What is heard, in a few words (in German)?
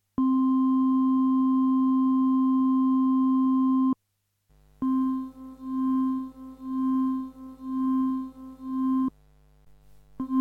Keyboard (Orgel 16+4) direkt, dann moduliert mit Sinus 1, 2, 3, 5, 10, 20